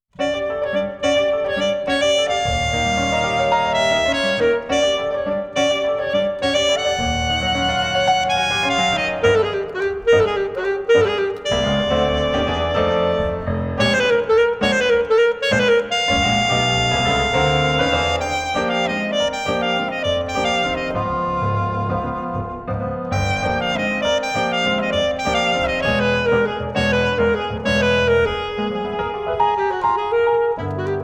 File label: Hot-Sonate 2. Movimento; Schulhoff